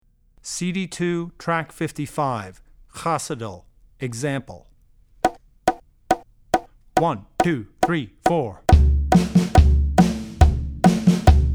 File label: Klezmer - Khosidl; Drummer's Bible Tracks